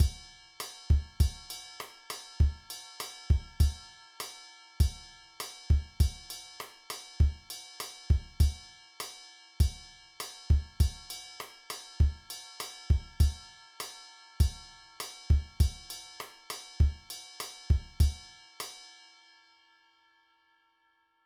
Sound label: Mambo Beat, drum